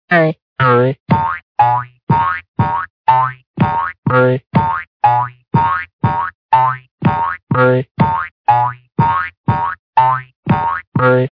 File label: Bouncing poking sound